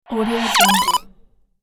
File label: electronic data beep slide